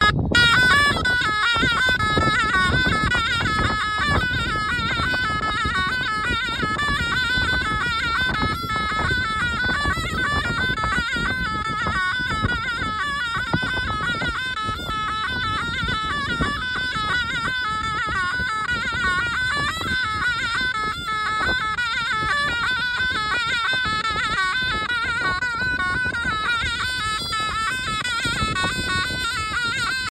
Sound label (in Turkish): Efsane Zurna Erzincan Duzu